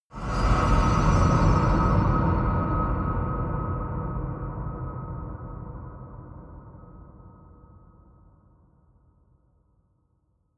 eerie industrial hit, hits impacts

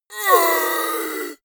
monster gasp; kreaturen

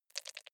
click erratic wide; signale

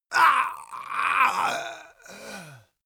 male death weak; schreie; menschen; soundpool

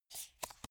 playing cards flip; comedy-cartoon; spielzeug

